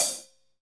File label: HH, KIT Real Kit; 90 s Sample CDs; Sound & Vision - Gigapack I CD 1 (Roland); Real-Kit m15